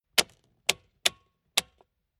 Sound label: Industrial Safty Lid Lock Clicks Desiel Truck Tank Slowly Tightening Lid